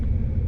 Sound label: spinner spin; 『HowlSakura』 - DT